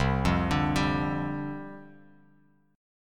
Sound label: C Suspended 2nd Suspended 4th C Bass Standard; Csus2sus4 arpeggiated